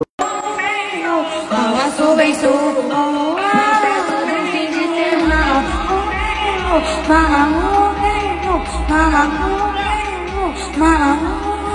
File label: Funk Kid Version